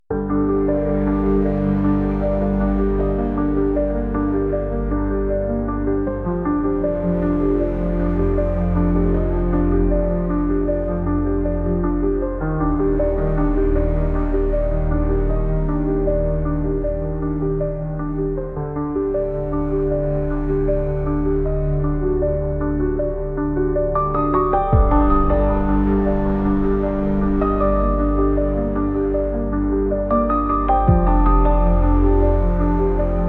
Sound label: Instrumental